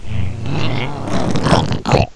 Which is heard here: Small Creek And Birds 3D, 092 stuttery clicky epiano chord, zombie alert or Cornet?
zombie alert